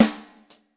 00s verby acoustic snare sample a key, Royality free steel snare drum sample tuned to the A note